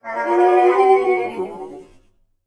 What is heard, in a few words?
Mech Death Vox; Sid Meier's Civilization 4 Complete; Beyond the Sword; Units